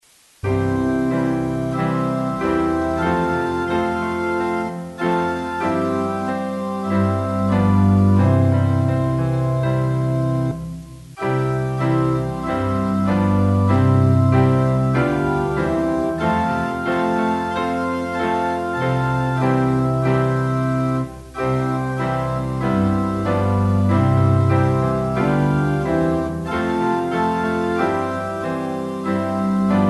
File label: Jesus Loves Me organ piano